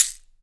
CAXIXI, SHAKERS; Big Fish Audio; Ross Garfield Drums VOL-2

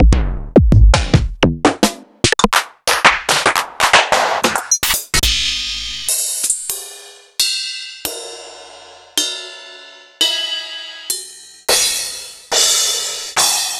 Electro; proteusvx

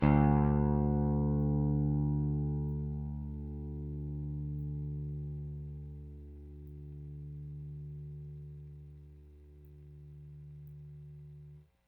Rickenbacker Bass; Airwindows

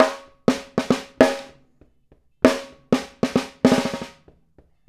Snare Pattern, Drums; Essential Percussion

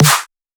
epic snare

